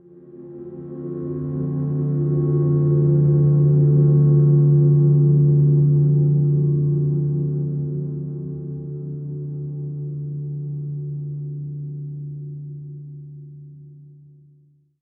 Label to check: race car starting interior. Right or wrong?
Wrong